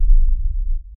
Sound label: deep heavy footsteps, hard floor